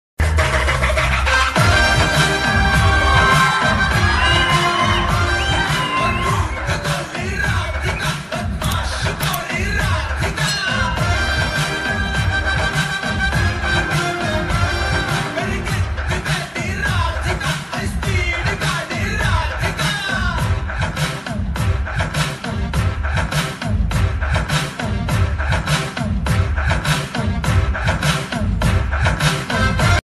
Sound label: bgm ringtone; radhika reentry; tillu square movie